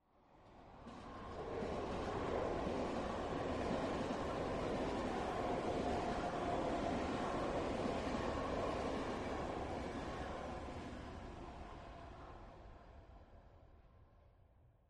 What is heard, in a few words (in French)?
passage train avec silenzo